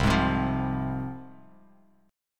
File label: C Suspended 4th Sharp 5th; strummed; JGuitar